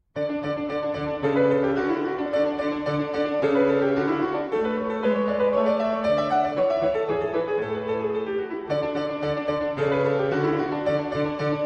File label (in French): anglaise; beethoven ludwig van